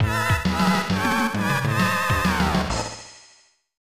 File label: Own Goal; dolucky's a league soccer; snes; Pochi Funky Dogs